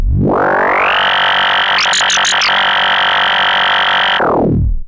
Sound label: Moog C2; Hollywood Edge; Giorgio Moroder Rare Synthesizer Collection; MLTI.MOOG